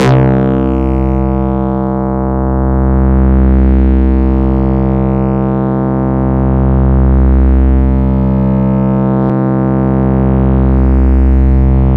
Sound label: AnalogBass c1, Yamaha YX81Z